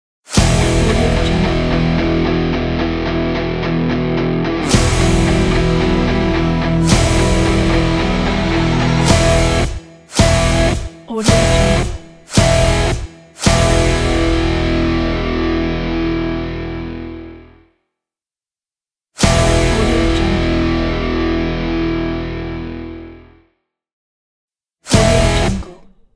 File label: epic rock trailer ident